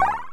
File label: MOVE; C64 Dreams; Multi-Sound Default